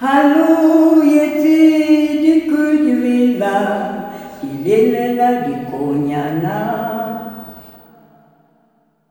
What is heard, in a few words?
LULLABYE, SOLO FEM; Spectrasonics; Heart of Africa 2